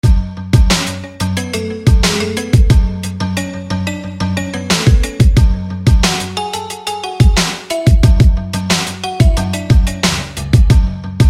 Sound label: Kalimbright; SFB FX